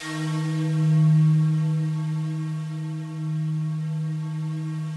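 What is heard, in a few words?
FOG ATTACK D#2 Right, Yamaha VP-1; Music Store; 90's Sample CDs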